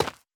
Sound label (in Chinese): 滴水石破坏声